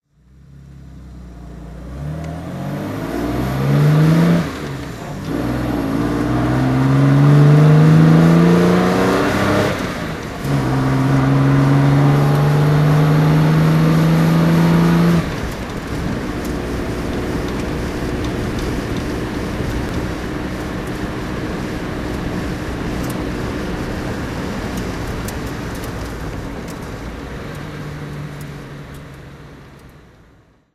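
jaguar xk150 34litre driving, Engine sound driving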